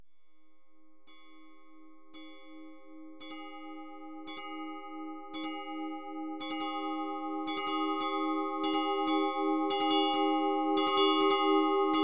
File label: Spiral Delay Panner